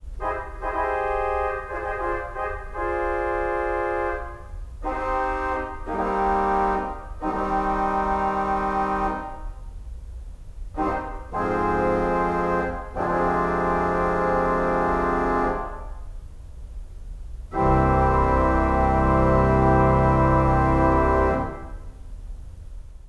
tuba mirabilis

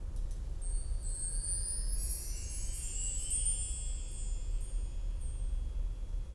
wind chimes

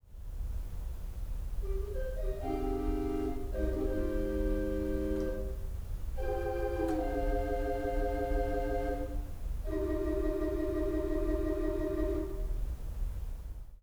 leiblich flute

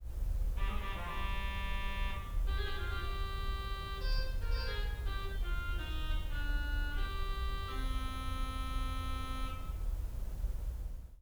orch oboe

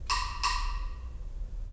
wood block low

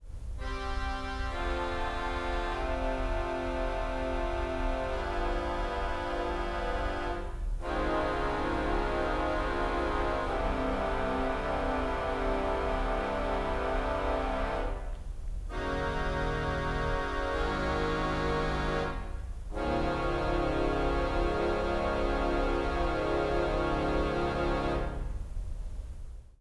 violins

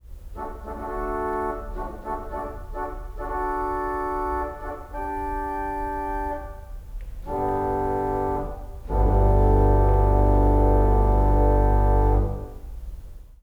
tuba horn

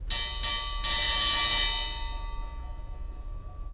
fire gong

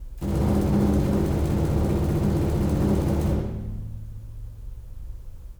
timpani roll

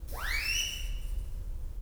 siren

